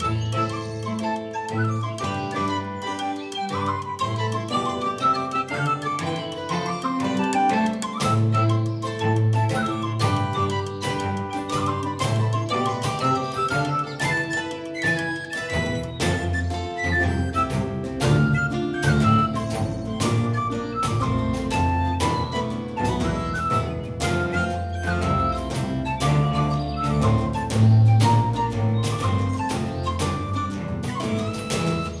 main game loop level 1; netent

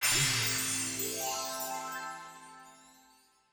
medium win

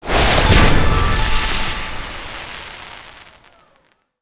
medium win; netent

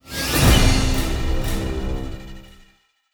add 1 roll; netent

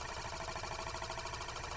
reel spin

